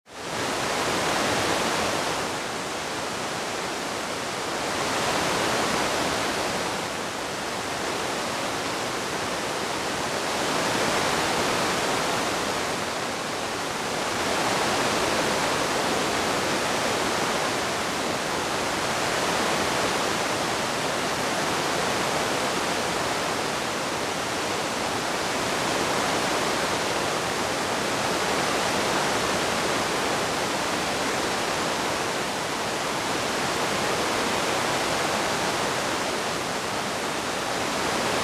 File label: solar radio